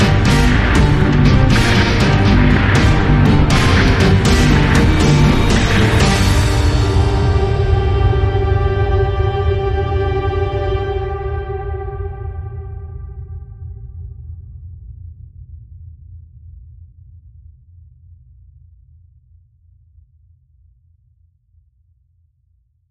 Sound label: Gothic Action Music Stinger; bbc